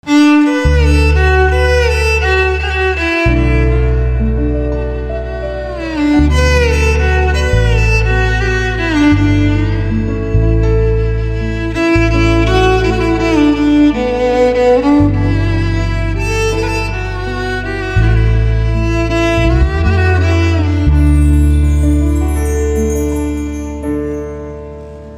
Violin Slow

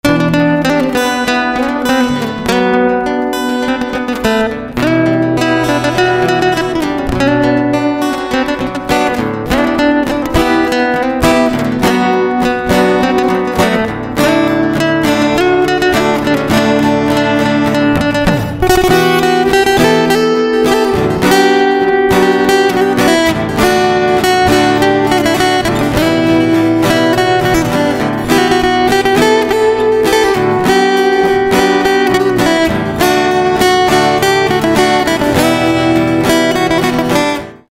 Guitar

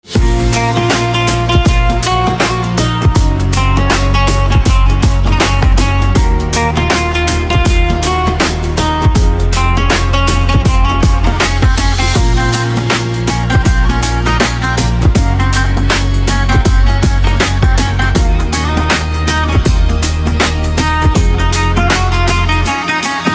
Rabab

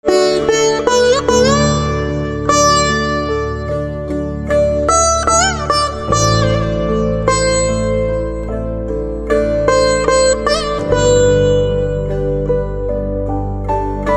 Veena, instrumental ringtones

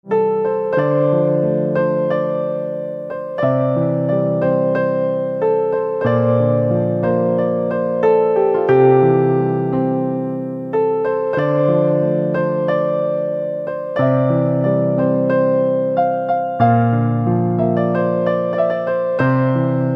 Piano

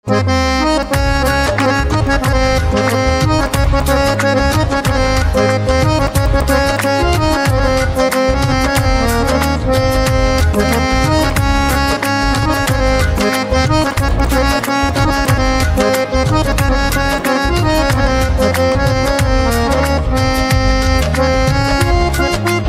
Harmonium

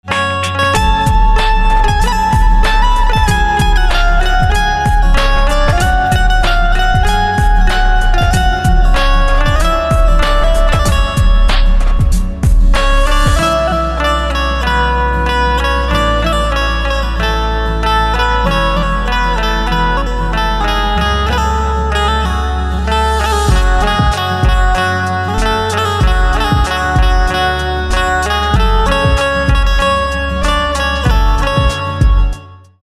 Banjo

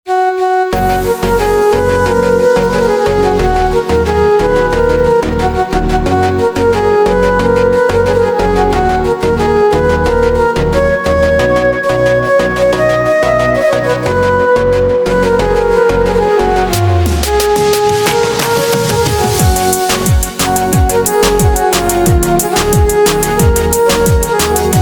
Organ Remix; instrumental ringtones

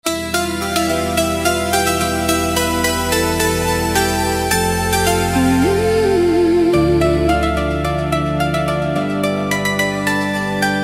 Santoor Remix

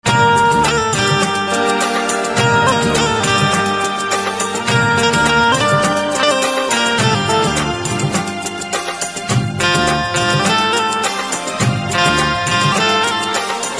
Banjo Ringtone

